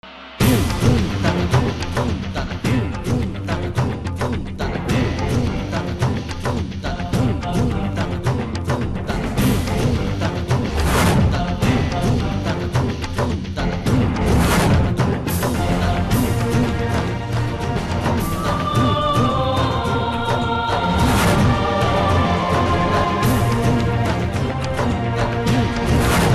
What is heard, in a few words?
Dramatic Music